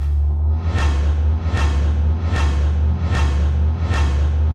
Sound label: Machines; Dream Zone